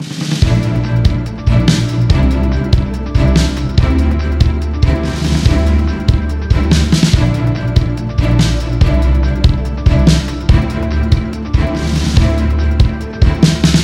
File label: victory